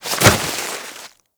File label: Generic Roll Snow; Warframe; Characters